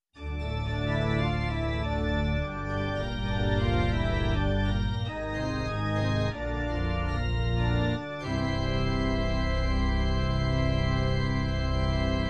Resolving Church Organ Chords

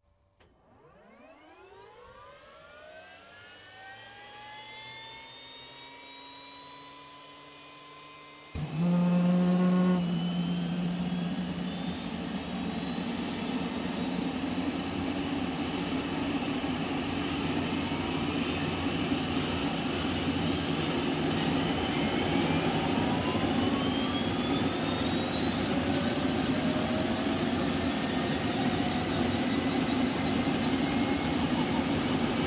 Helicopter engine start

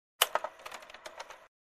Camera Film Reload

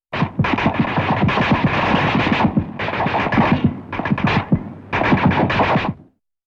Machine Gun Sound

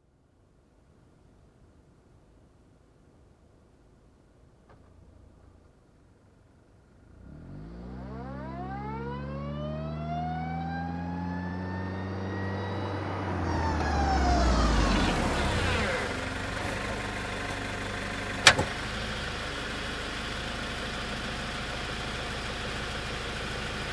Car reversing and stopping nearby

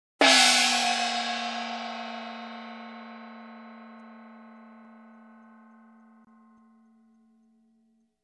Cymbal crash

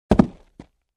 Box drop bang sound

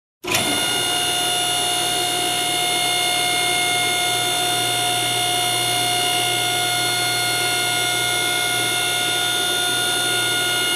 Machine movement

